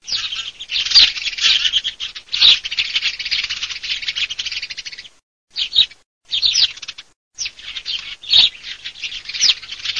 passeri primavera

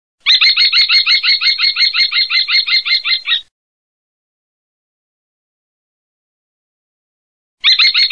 GHEPPIO

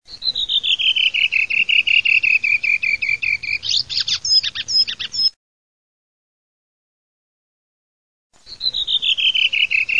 sassello

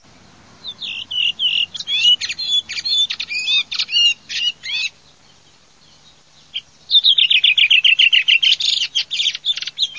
SASSELLO VERSO

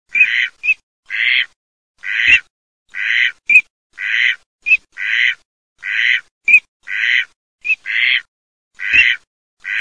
PADULE OASI

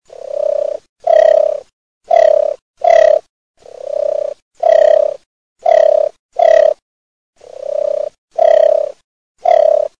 TORTORA

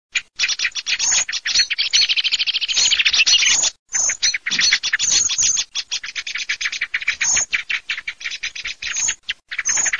SCHIAMAZZO

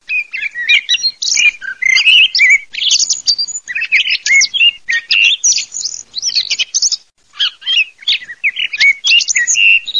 TORDO MERLO

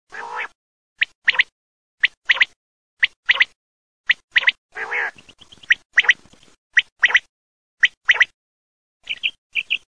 QUAGLIA

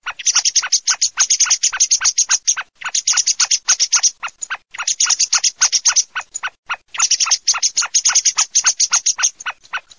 SCHIAMAZZO MERLO

